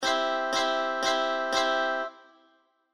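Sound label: guitar tech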